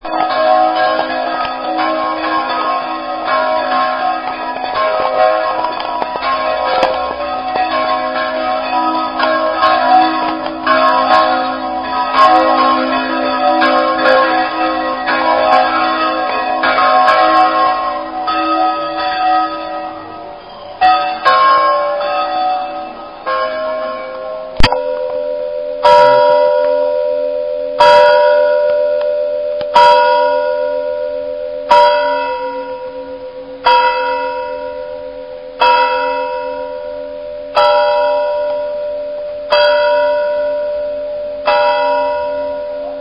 Campanas

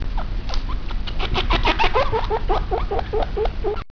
chinchila macho femea

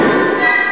flauta tambor

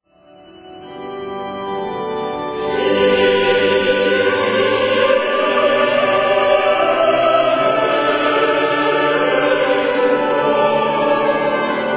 kyrie octeto; coros solistas; schubert

